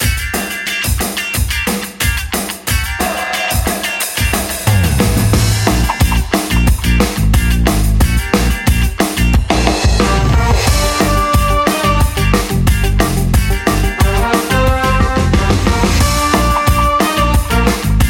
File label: Drummer HEAVY; uJAM; Virtual Drummer